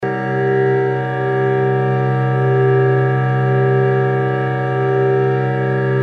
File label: microtonal variations of the all-interval-chord; akkord 2 c g e-1 ais-1 cis0 fis0